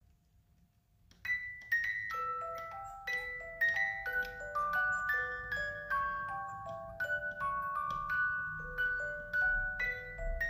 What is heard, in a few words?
18 lames la boheme, boite a musique; Boîte à musique en bois personnalisable